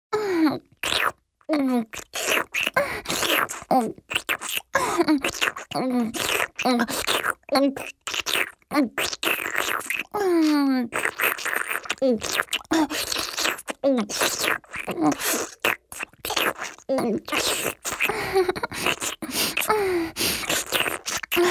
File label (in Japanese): パイズリフェラ(中)：陰茎を胸でしごきながら亀頭をしゃぶる; 実用ボイス抜粋集